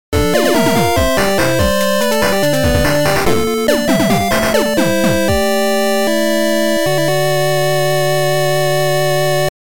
gameover; neko very color roguelike

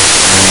White Noise

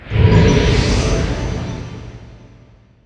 dark

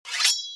sword

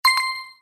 bomb

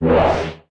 switch

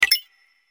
load

